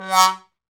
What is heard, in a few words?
BONE, Trombone; Solo Brass